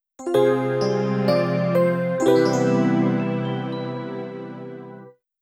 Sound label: Jingle Reconciliation Successful; tomodachi collection new life